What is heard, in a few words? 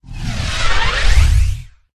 golem